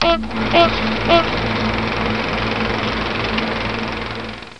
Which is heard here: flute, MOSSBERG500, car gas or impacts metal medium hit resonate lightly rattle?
car gas